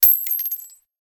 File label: brass, weapons